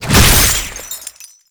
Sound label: Chain Latch; Roathe Summon Catenach; Warframe; Tau